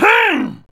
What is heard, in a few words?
Grunt; Lewd SFX